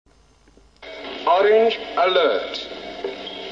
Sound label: alert; prisoner